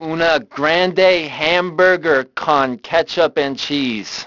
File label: band; Grand Theft Auto Vice City; XBOX